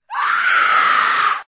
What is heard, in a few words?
witch, zombie; dod